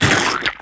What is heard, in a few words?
CRAB SPIDER Attack